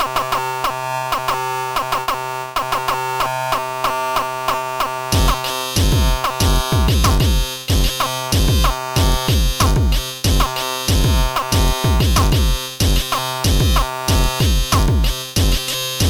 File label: non dunnont run, chiptune